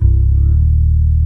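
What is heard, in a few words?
fretless D#4, bass; AKAI S6000 CD-ROM - Ultimate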